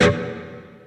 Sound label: Chug